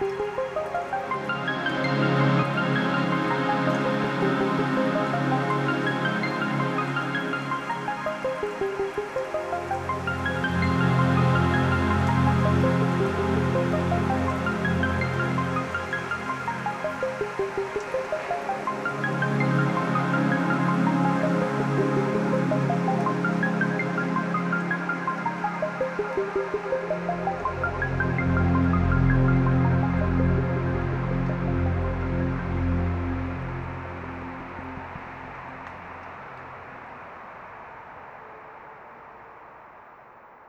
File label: Big Arp Pads; BB 2HTC Samples Vol 4